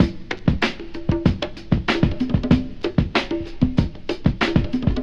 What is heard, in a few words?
lotsa bongos, funky drum loop; Drum loops